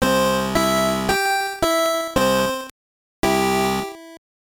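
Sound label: Jingle, Game Boy Color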